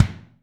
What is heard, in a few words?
BD 1H R, DRUMKIT